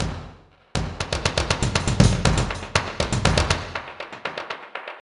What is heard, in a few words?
Music Combat one shot beat